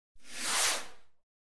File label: Pyrotechnics